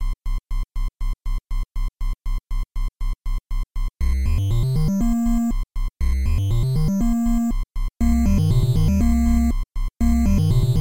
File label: chords and scales test